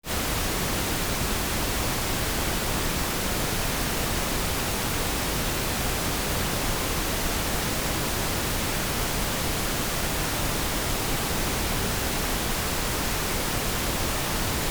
Noise Measurements; Rohde & Schwarz; UPA; Rohde & Schwarz UPA-CD

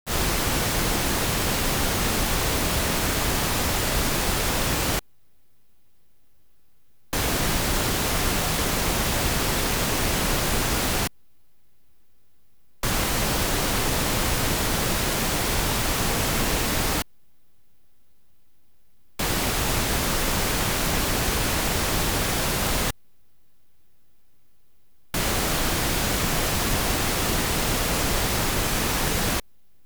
Polarity Test for Speakers; Rohde & Schwarz; UPA; Rohde & Schwarz UPA-CD

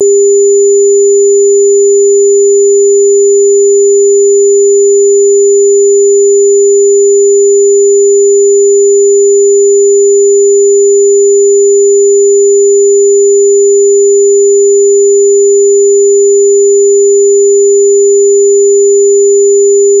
Intermodulation Distortion; Rohde & Schwarz; UPA; Rohde & Schwarz UPA-CD

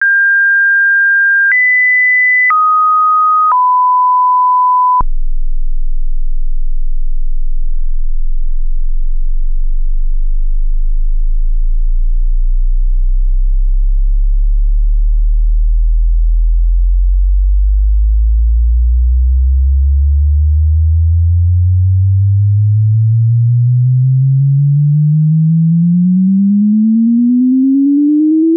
Precision Mono Test with Sweep; Rohde & Schwarz; UPA; Rohde & Schwarz UPA-CD

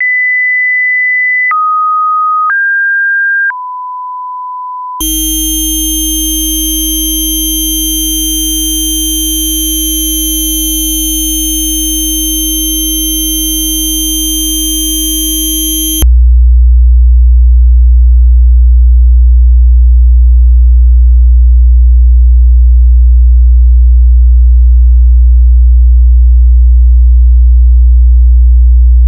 Quick Stereo Test with Multifrequency; Rohde & Schwarz; UPA; Rohde & Schwarz UPA-CD

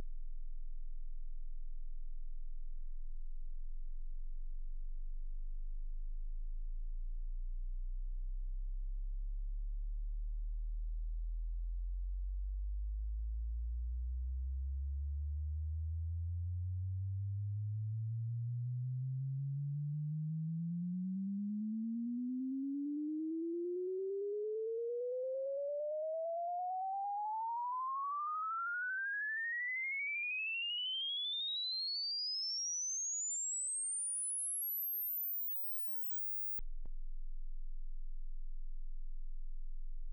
Frequency Response; Rohde & Schwarz; UPA; Rohde & Schwarz UPA-CD

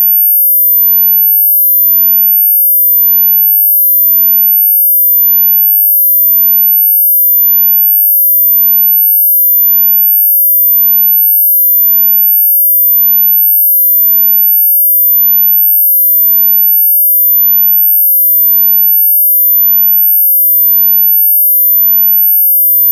Difference Tone; Rohde & Schwarz; UPA; Rohde & Schwarz UPA-CD

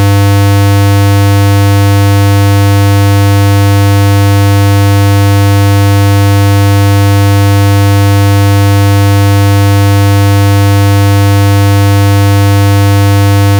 Step Response; Rohde & Schwarz; UPA; Rohde & Schwarz UPA-CD

